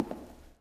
wood, Footsteps; civ13; civ14

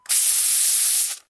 refill

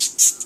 moth squeak; civ13; civ14; Voice

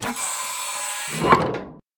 airlock close; Machines

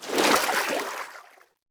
wade, Footsteps, Water

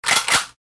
lever action old

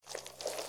snake, Footsteps

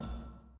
catwalk, Footsteps

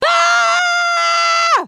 female generic warcry

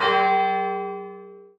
church bell

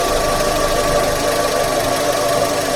gasoline loop, vehicles engine

